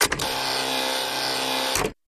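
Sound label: Typewriter Electric; typewriters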